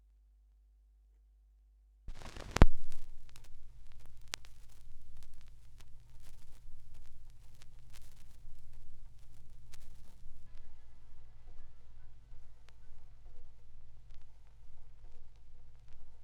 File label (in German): Schallplattengeraeusche; rauschpartikel